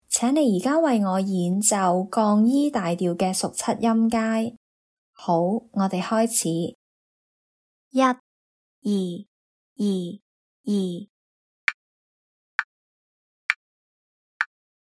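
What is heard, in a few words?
dominant 7th in the key of E flat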